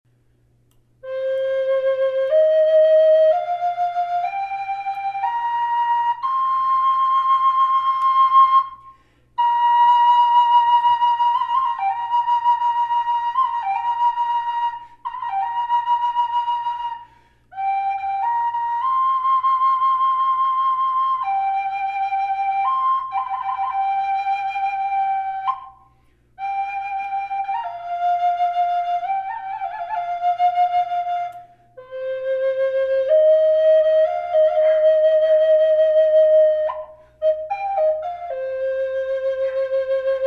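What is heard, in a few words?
reverb, flute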